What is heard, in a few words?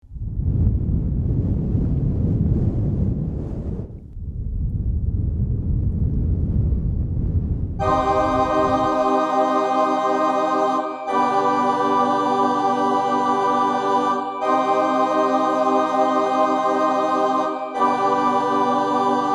Gnarly Chords; spellman studio